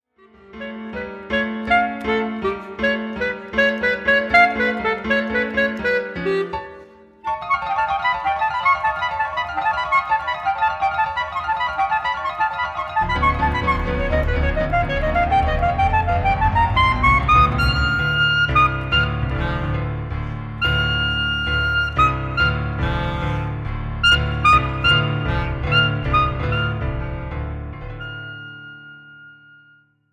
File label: clarinet piano